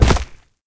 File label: queen foot; Attack of the Radioactive Thing